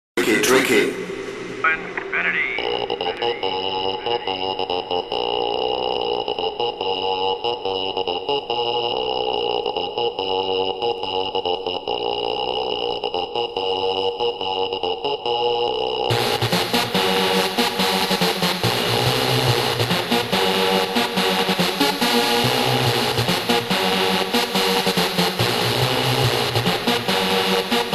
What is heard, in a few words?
Trance